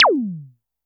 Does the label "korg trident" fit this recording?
Yes